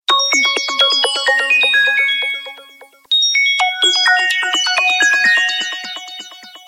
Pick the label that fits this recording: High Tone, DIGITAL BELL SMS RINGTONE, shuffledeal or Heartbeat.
DIGITAL BELL SMS RINGTONE